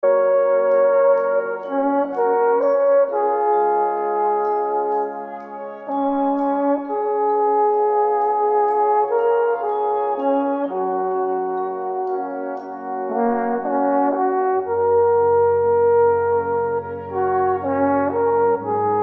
alphorn ringtone

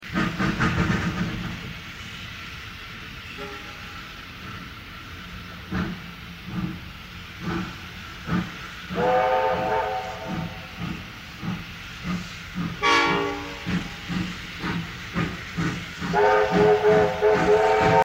steam train ringtone

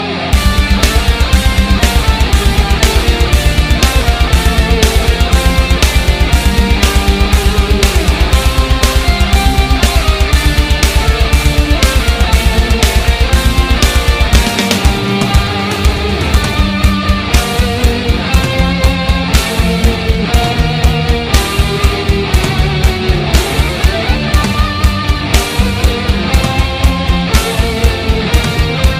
tetris game metal ringtone

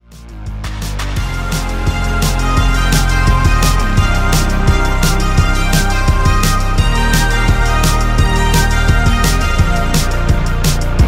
instrumental ringtone

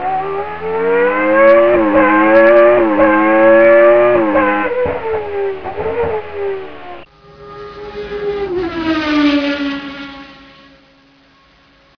formula 1 motorsound ringtone

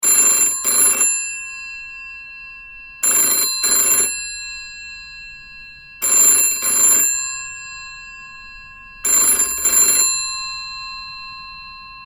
classic phone ringtone

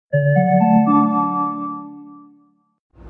oebb station tone ringtone

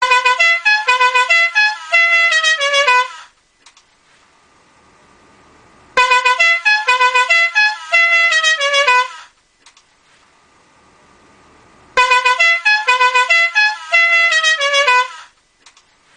la cucaracha horn ringtone

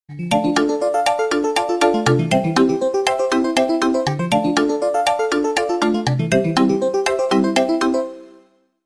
siemens ringtone

